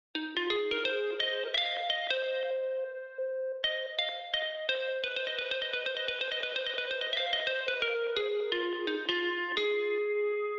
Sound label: Classic Melody Loop 86 BPM F Min Pluck; Cymatics; Oracle Sample Pack